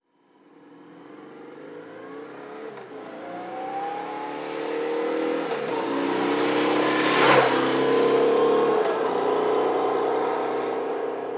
moto, trafico

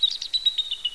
pajaro

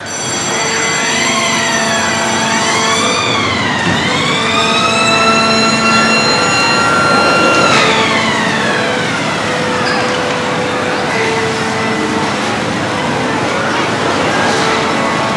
parque, parque de atracciones

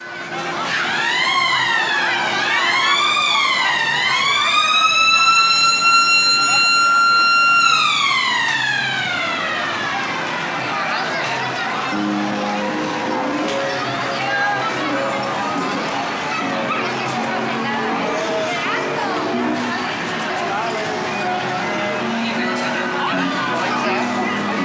carrusel, tio vivo

